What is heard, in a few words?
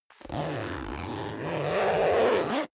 zipper down jacket close